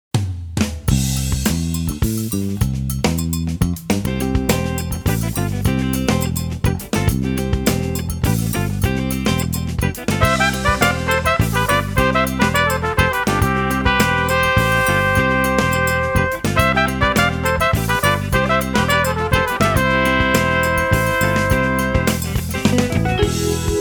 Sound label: Agogo